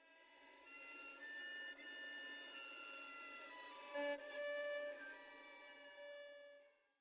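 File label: violin ethereal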